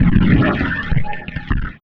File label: Paper Bumpers; Sonic Foundry (Sony Creative Software); Esoterik Beatz; Paper Fx